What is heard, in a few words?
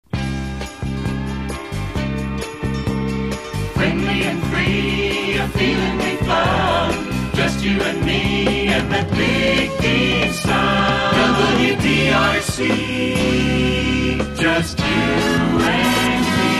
Vocals; WDRC; Friendly & Free; Thunder; Dallas